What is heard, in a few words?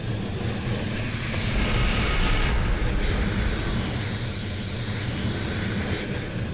Flame Machine